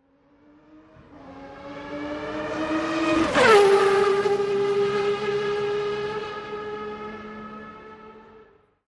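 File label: Sports Car Close Up By Fast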